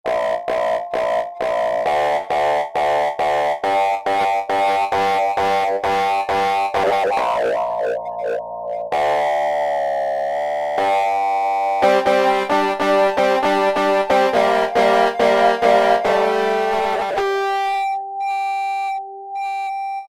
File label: Tek Lead, E-mu Morpheus; Factory Presets